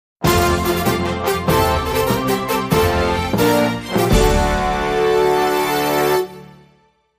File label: Big Fanfare